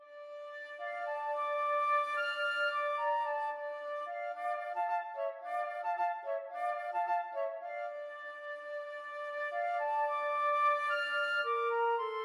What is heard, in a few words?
flute duet